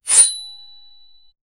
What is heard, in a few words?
hit sword for 2d game